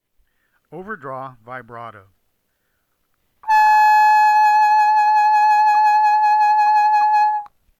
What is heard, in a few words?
Overdraw Vibrato